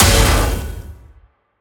attack block

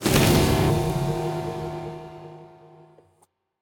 casting spell necrotic

